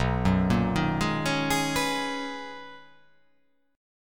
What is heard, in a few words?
B Major 13th B down